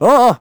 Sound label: ice slip; Crypt of the NecroDancer